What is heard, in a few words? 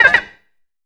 SYN FX, PERCUSSION; AKAI S6000 CD-ROM - Volume 5